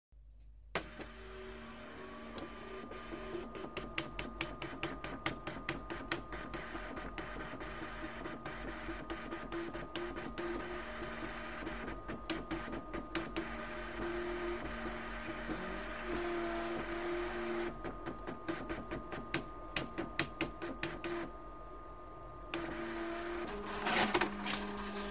poluchenie faksa

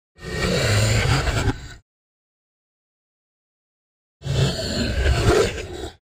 dyhanie monstra

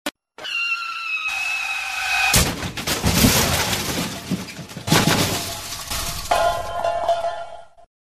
vizg tormozov i udar

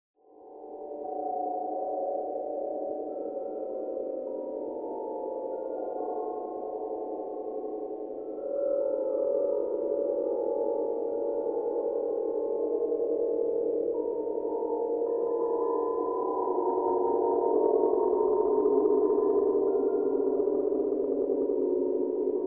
zvuk kosmosa

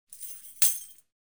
padenie kljuchej

